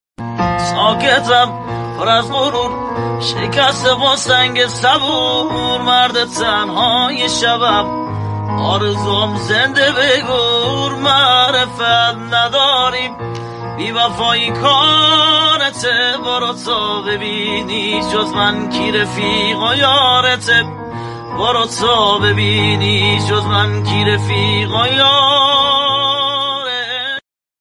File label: music pap ba gitar; music pop ba gitar